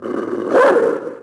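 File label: wolf attack; DAoC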